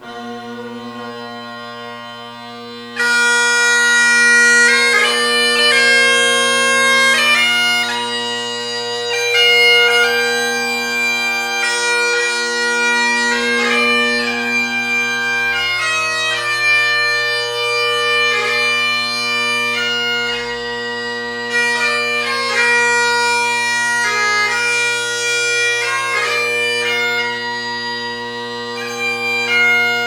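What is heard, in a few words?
flat bass, bagpipe; kinnaird flat bass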